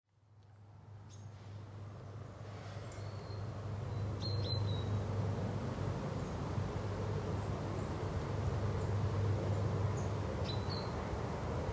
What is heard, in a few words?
Jersey Marine Soundscape